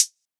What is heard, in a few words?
closed hihat, drum one shots; Cymbals; Hihats - Closed; Cymatics; Cobra Hip Hop Sample Pack